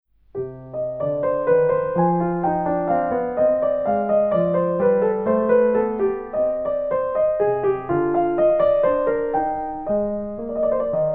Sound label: French Suite C Minor Menuet; J.S.Bach; BWV 813